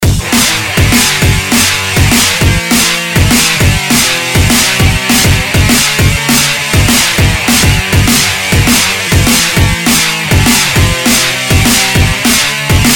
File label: Drum bass Remix